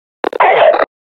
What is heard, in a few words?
hit; combine